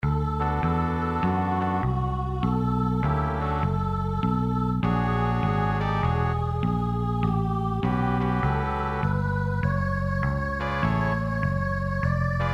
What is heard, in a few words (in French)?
Avec section de cuivres; harmonie jazz